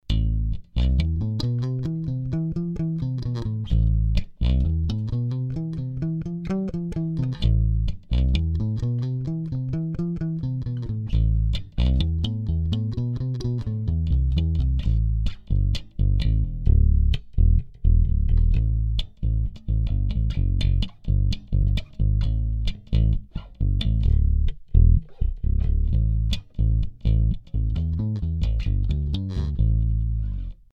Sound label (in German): Finger Style mit Mute Pads